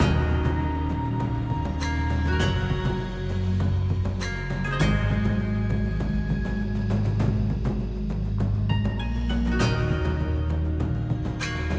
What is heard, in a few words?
ambience Free Spin; netent; longpao